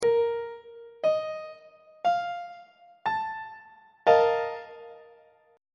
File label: b flat major 7th suspended 4th chord on treble clef